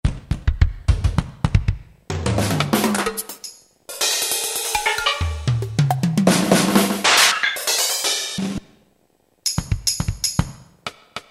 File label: DR Group, Yamaha TG77; Factory Presets